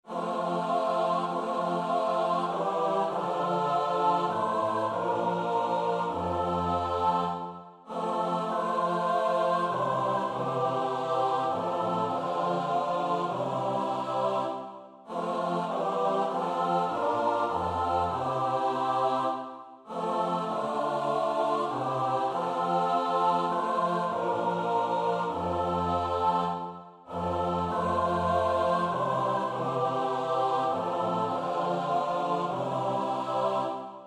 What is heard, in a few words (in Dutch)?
S-A-T-B; Nieuw Liedboek; NLB 244 (Nu is de dag ten einde); Vesperkoor Bunschoten-Spakenburg